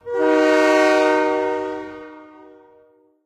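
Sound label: train horn short; video games; source engine; portal2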